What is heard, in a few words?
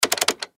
Button Switch Gear Multimedia; Web Interactive; Blastwave; Technology